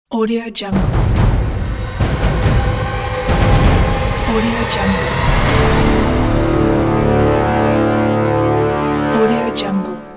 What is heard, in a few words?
chaos orchestral opener logo